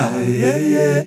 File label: Male Voice, African Voices